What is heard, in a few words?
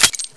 m60 chain press